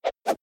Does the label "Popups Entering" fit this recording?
Yes